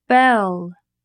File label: bell